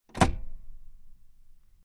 Wagoneer: Parking Brake Lock / Release, From Interior Perspective, Jeep Wagoneer